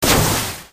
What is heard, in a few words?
hand; Alive